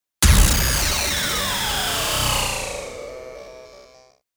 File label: glitched intergalactic impact